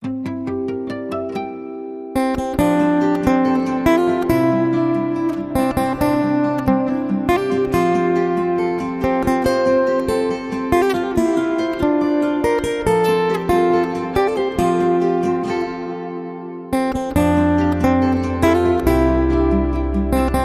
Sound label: Acoustic Guitar Happy Birthday